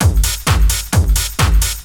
techno, DRUMLOOPS

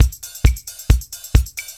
pad, Deep House, 135bpm

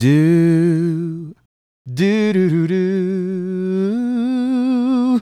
Male Solo, Hip-Hop and RnB Vocals

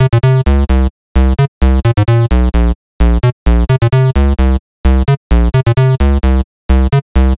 NRG Bass, Trance

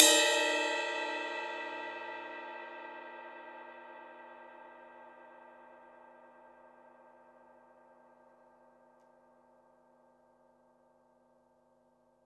cymbal, deep house single hits

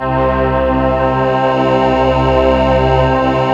ANDROIDS; Greytsounds VOL-1

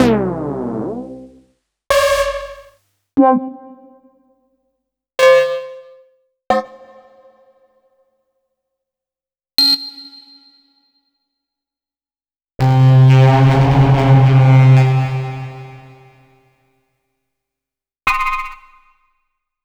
LEAD

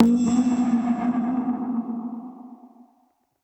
Perc Hit, dub percussion

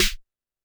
TD-20 Tom A, Roland TD-20 Toms

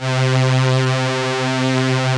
vocoders; Sound & Vision - Gigapack I CD 2

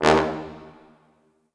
Orchestral Brass

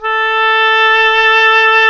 WND OBOE2, Oboe vib

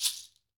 TANZANIAN, African Perc; E-MU Formula 4000 Series Vol. 4; Earth Tones

